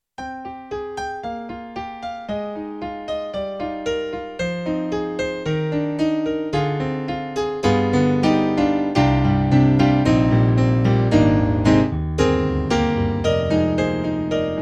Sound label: YAMAHA MOTIF-RACK ES, Brite Grand